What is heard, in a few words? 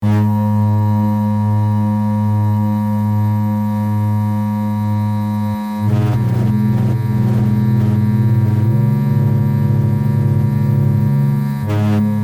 neofolk drone